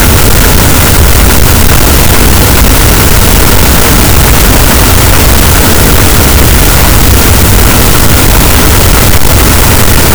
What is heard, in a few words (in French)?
cachalot, gaussian noise; Physeter Macrocephalus